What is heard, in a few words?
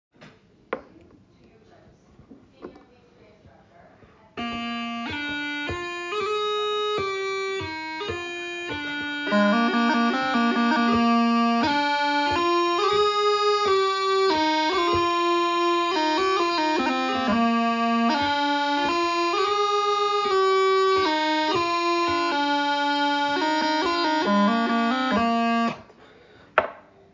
New Medley Ender